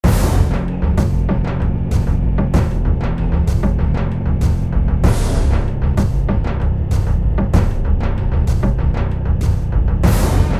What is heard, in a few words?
Battle; n64; aidyn